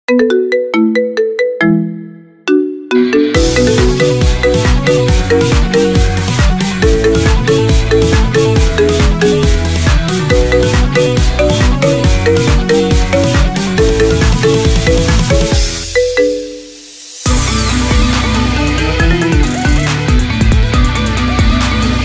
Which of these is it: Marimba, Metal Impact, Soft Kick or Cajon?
Marimba